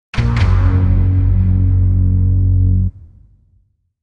Scifi Audio Logo Ident, Short, Serious, Big Drum; Scifi Audio Logo Ident 10,Short,Serious,Big Drum.

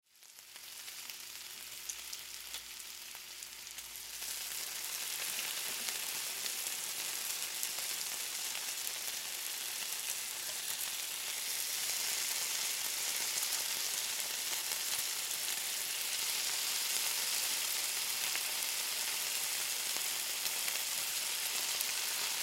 Food Cook Bacon Open Pan Sizzle Long; Food (Sound Effects:Household); Foley; Blastwave

